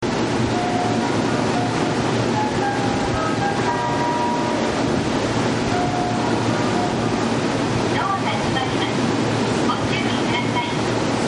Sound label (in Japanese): melody; ３１００番台; ３１３系